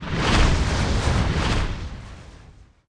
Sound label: fireballs; Alexander